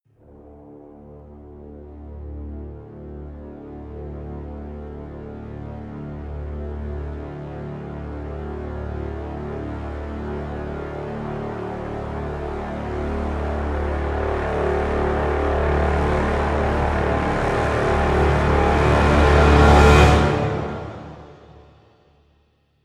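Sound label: crescendo brass swell lo D; brand x music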